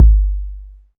long room reverb kick drum sound g key, bass drum sound tuned to the G note